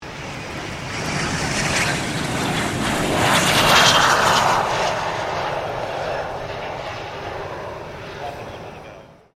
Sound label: AIRCRAFT BAE HAWK 127 LANDING; Airplanes (Sound Effects:Vehicles)